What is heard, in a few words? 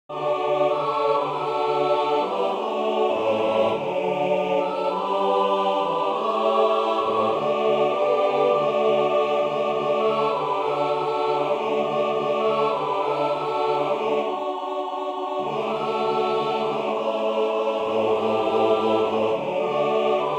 Joy to the world aah